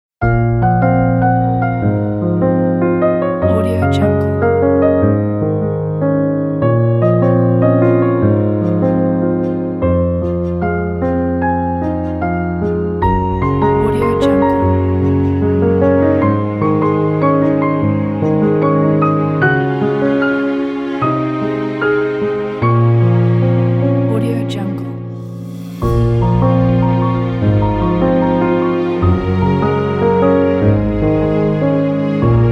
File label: flowing piano and emotive cello